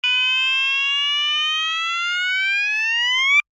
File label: alarm tone, sounder alarm tone; sounder beacon